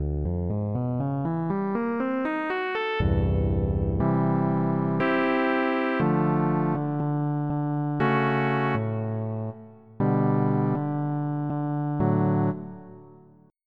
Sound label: Fretless Bass; 2 channels